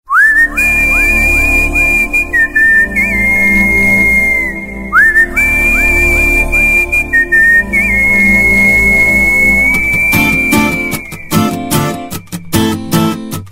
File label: Sweet Whistle